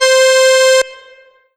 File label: Roland D 20 Tango Passion C5